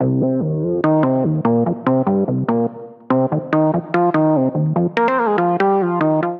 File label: Multi Solo (Cm) 145bpm; Music Loops; Singularity Multi Solo; Ableton; Factory Packs; Singularities